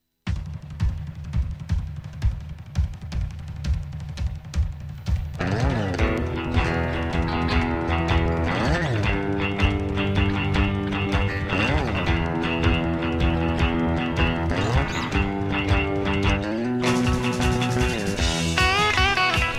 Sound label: Driving Guitar; ストラト改のリニューアル・バージョン